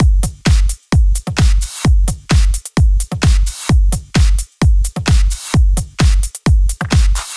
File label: 128BPM Drums; Vengeance Samples; Machine Kit